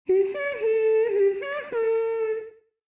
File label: Humming; Hyrule Warriors Definitive Edition; Midna's Humming